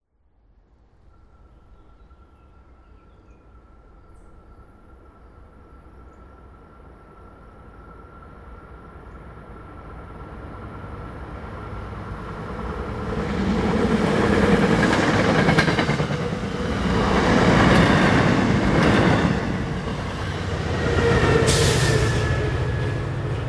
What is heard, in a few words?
TRAINS MBTA